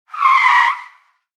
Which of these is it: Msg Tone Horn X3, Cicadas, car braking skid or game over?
car braking skid